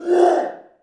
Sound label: Giant DMG; Seer; GamigoNA